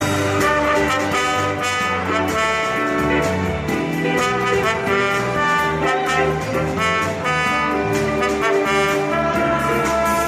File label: pop orchestra and choir